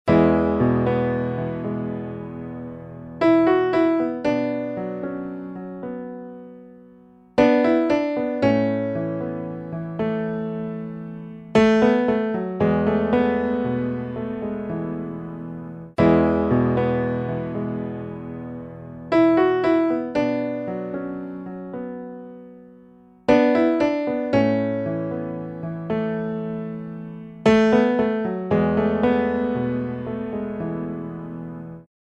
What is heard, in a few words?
Piano Elegance Stereo